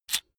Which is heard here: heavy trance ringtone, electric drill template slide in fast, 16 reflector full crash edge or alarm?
electric drill template slide in fast